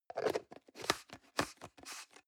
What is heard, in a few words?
plastic can small lid screw close medium